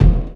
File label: Kick, Drums Percussion; Zero-G; Total Drum Bass